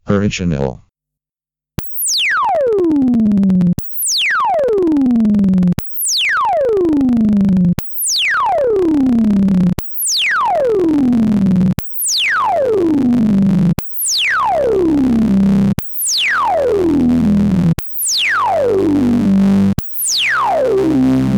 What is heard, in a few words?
MS20 Original Mini Legacy HPF Max Resonance